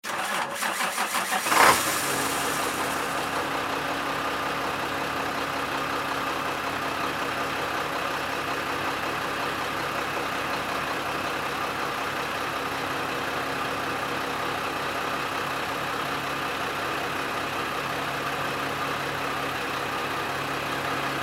Semi Truck Mac, Semi Truck Mac E7-460 Start Up Drive Off; Semi Truck Mac E7-460 Start Up Idle Drive Off; Semi Truck Mac E7-460 Pull Up Idle Rev Engine Shut Off; Truck Sound Effects; Blastwave